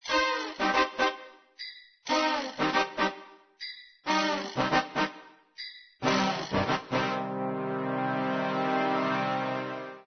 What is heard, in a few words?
Downbeat; RoboTutor